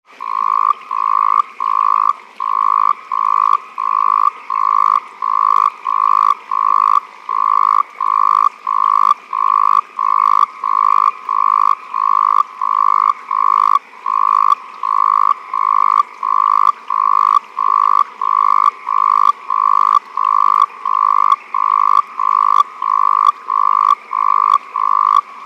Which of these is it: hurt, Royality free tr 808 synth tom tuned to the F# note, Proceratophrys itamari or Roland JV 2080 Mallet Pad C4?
Proceratophrys itamari